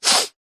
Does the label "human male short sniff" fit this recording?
Yes